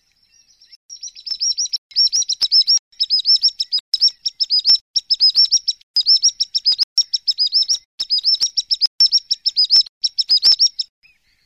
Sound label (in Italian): canto